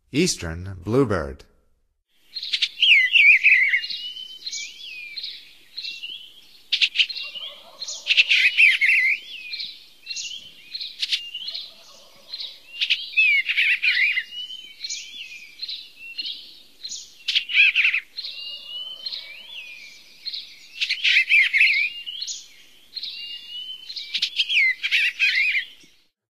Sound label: Eastern Bluebird, Songs of Birds of North America; Stokes